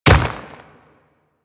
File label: Dirt Step, Footsteps; Royal Guard